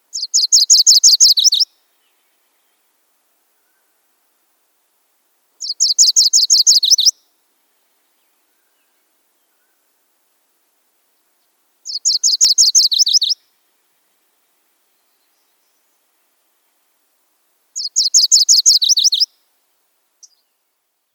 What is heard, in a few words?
MacGillivrays Warbler